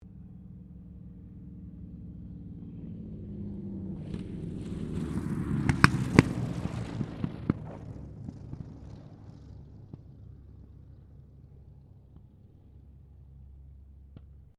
AIRCRAFT HAWKER SEAFURY LAND, Hawker Seafury fighter-bomber; Airplanes; Vehicles; Aircraft Collection; Twistedtracks